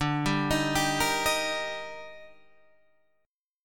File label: D sharp Major, Guitar; D#/D arpeggiated; JGuitar